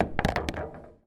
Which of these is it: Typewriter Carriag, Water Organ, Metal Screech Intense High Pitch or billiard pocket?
billiard pocket